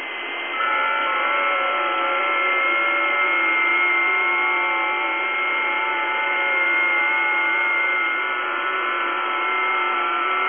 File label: websdr test, ft8